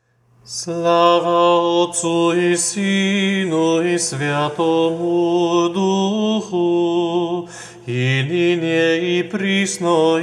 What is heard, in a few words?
Vask Kont glas 8, Serbian chant